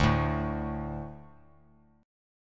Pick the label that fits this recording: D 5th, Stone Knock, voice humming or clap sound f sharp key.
D 5th